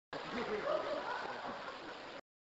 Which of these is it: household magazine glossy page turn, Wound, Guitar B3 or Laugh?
Laugh